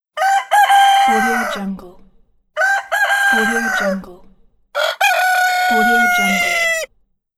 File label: cock crowing